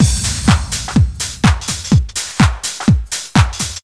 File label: desert fox, Deep House 125bpm